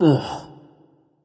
ugh; smite; voicepack; hou yi

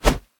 whoosh low; Smite; Cerberus

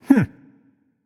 nv thinking; smite; voicepack; ganesha

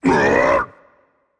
grunt hit rage; chaac

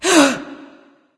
nv breath; smite; awilix

